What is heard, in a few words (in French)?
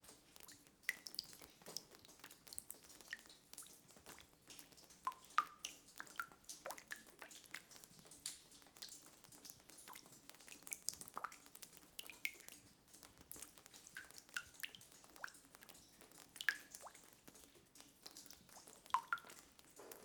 gouttes carriere